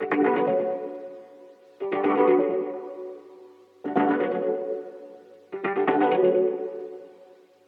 Synth Loop 125 bpm Dmaj; Unit Rcrds

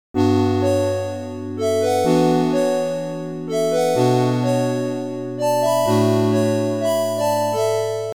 KEYS Euphoric

